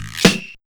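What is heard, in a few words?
reverse; Ragga & Dub Reggae; USB Soundscan vol.27; AKAI; 150 UK 2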